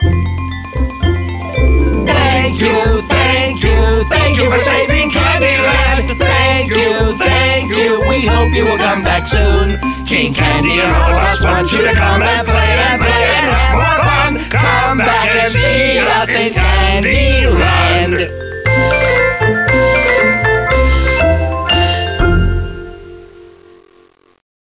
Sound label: End Chorus